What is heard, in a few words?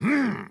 Attack Large; Batman